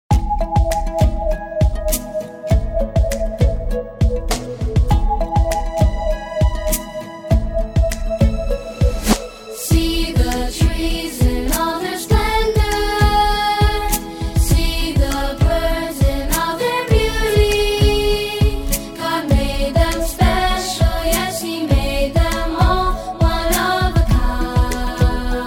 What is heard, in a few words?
Contemporary Vocal